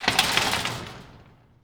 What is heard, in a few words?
fence shake, metal fence shake